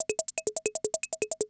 CR78

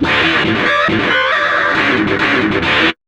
GTR, Chemical Beats

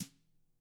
TAM Double Platinum Drums; ILIO; Double Platinum Drums 2; TAMBKIT

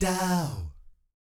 DOW F#3, Vocal Jazz FX; Spectrasonics; Vocal Planet; JAZZ COMBO

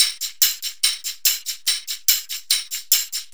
TAMB; Zero-G; Chemical Beats

